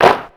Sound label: Clap, Ensoniq ASR-X Pro